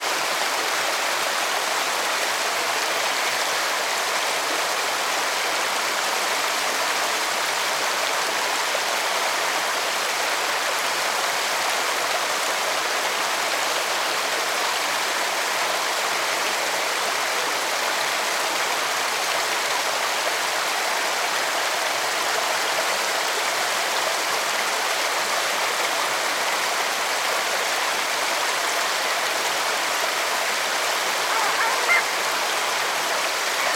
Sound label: water river fast shallow birds